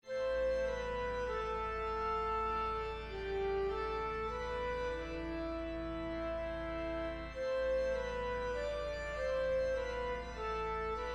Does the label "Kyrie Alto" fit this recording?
Yes